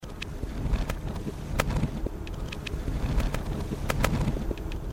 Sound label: Dug